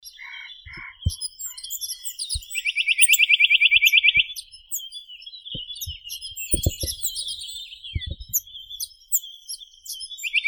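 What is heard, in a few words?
nuthatch, Sitta villosa; popolzen kosmatii; zvuki prirodi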